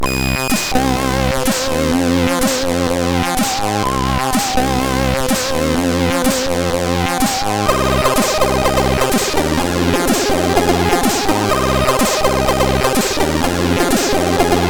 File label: Fem Laaten, MOS6581; MOS6581R3; HCL; hvsc